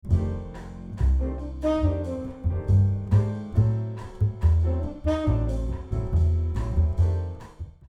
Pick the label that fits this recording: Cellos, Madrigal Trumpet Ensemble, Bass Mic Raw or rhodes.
Bass Mic Raw